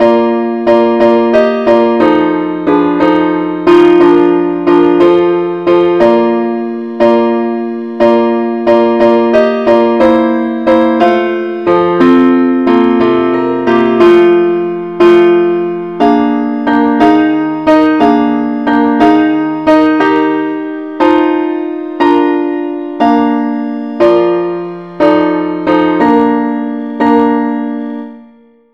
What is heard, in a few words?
parts only key es; angelvoices